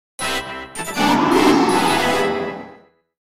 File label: 3DS Menu Jingle; Pokémon Ultra Sun; Pokemon Sun Moon Ultra The Definitive Soundtrack